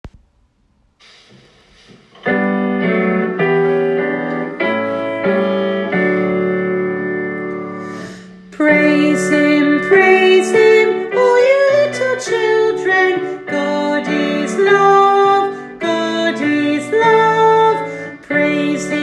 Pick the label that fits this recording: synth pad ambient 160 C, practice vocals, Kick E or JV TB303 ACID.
practice vocals